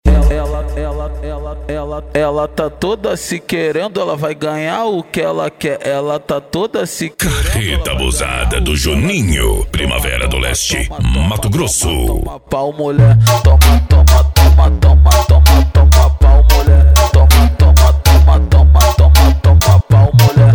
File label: mega bass